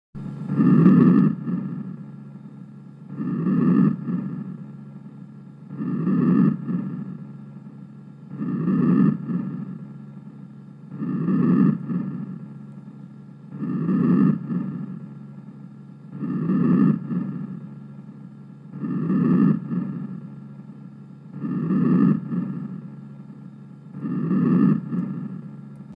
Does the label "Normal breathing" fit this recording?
Yes